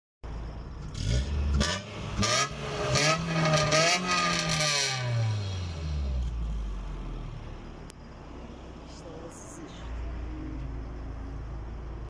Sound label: Bee exhaust